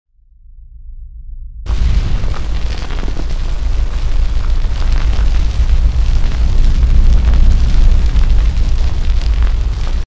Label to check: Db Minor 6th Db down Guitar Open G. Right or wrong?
Wrong